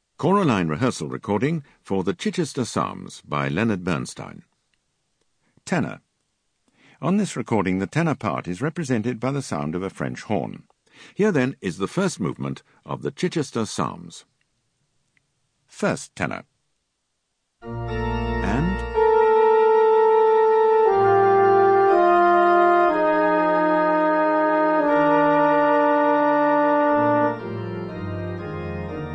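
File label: Ten, tenor; Bernstein Chich Psalms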